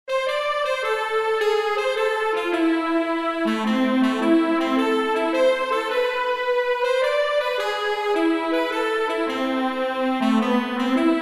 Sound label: Mazurka; senoisette